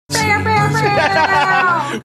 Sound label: making air horn sounds